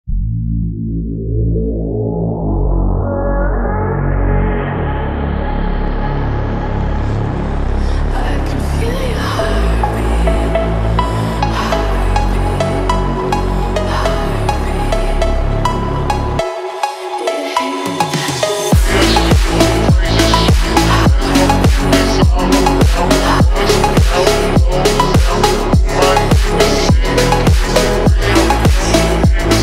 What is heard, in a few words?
slowed atmosphere phonk